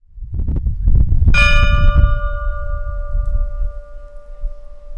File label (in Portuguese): Soar do sino